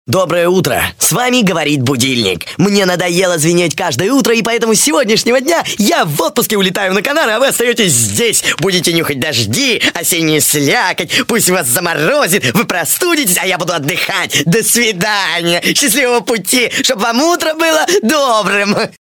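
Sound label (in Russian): мелодия на будильник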